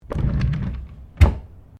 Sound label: Drawer Interior Wooden Utility Small Empty Close; Various domestic drawers; Household